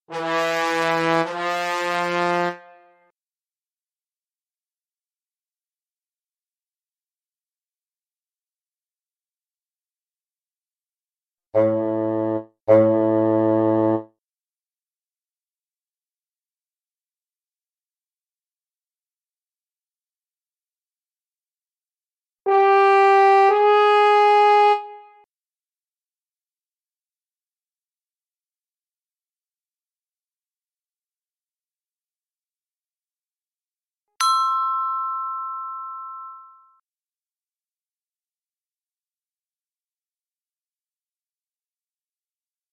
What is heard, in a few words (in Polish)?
różne instrumenty